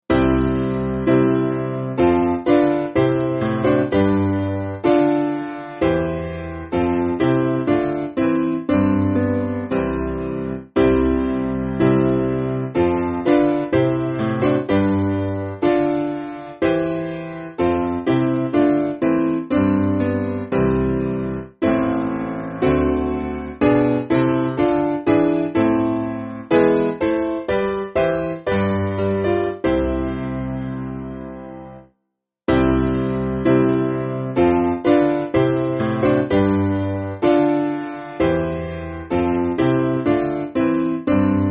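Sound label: Piano, Backing Files for Churches; Jesus Lives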